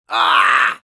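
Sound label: Demoman pain severe, Spanish; (Grito 2)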